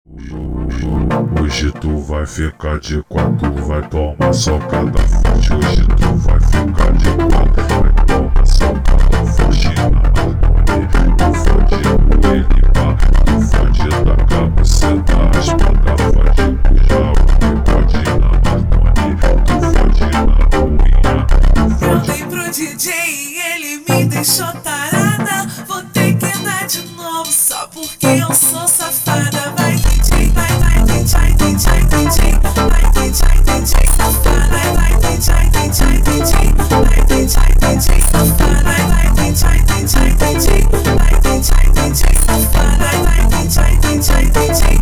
slowed, funk

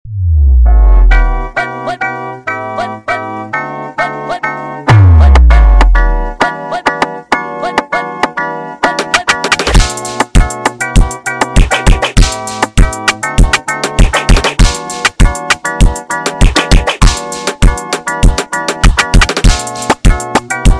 Brega sped Up; funk

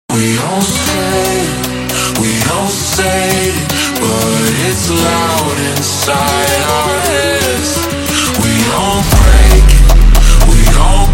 Funk - Super Slowed

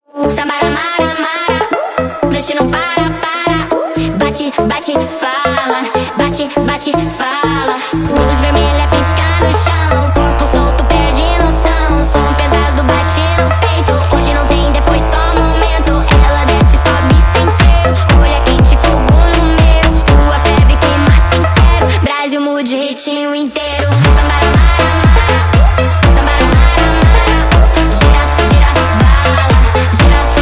sped Up, فانک

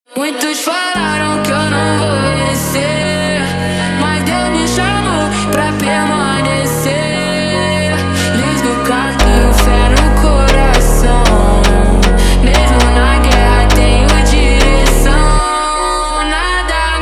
Montagem, Slowed; فانک

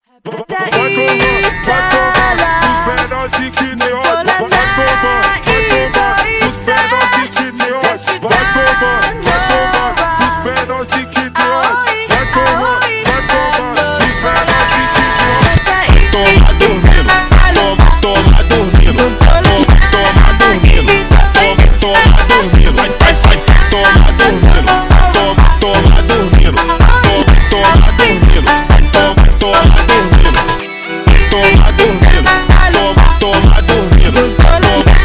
Funk super Slowed

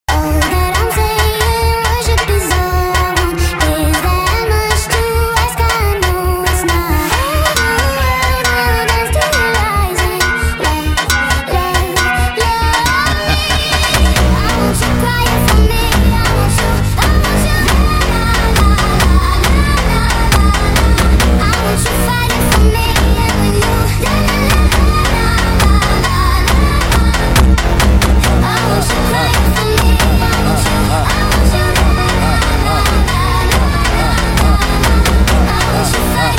sped Up; funk

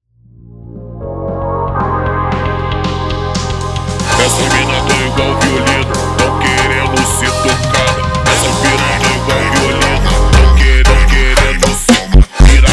Funk slowed

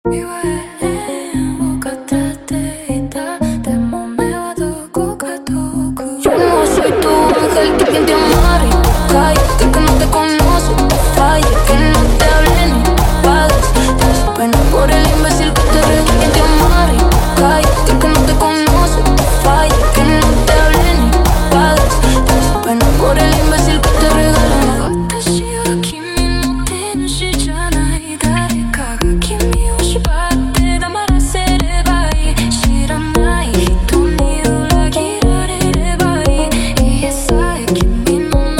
Jumpstyle slowed

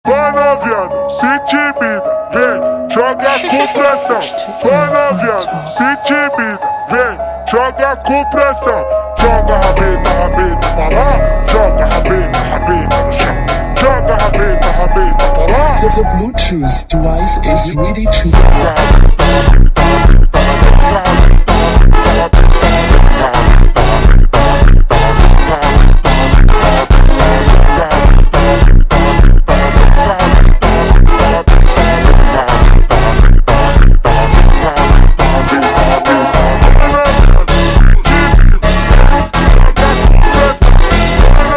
Funk ultra Slowed Reverb

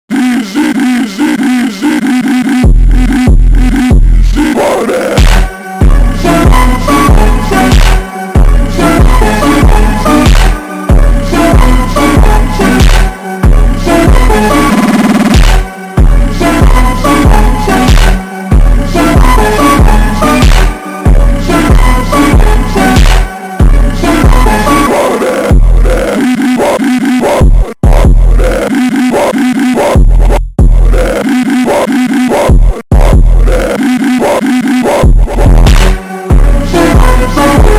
Funk slowed

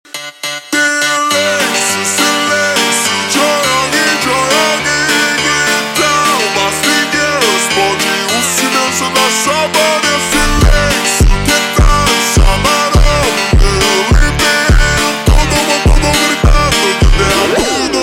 Funk, Ultra Slowed

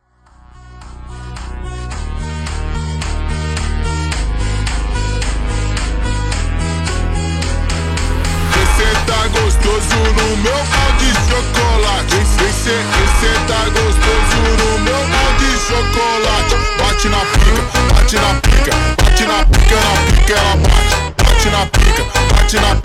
super Slowed; آهنگ فانک

